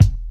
old school deep kick sample e key; kick drum samples

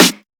steel snare drum b key; snare drum samples

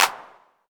clap sound a sharp key, Loudest frequency: 3373Hz; percussion; single hits one shots

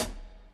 foot cymbal d sharp; hat samples

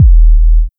short reverb bass drum sample D# key; kick

